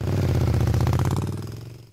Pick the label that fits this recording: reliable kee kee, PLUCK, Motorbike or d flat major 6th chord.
Motorbike